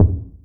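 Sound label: bass drum, right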